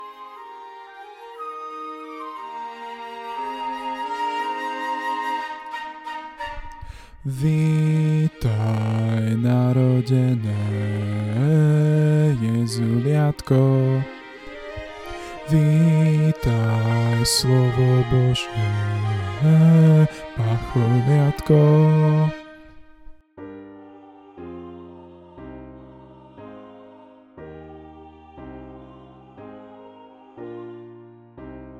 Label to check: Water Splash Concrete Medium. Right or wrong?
Wrong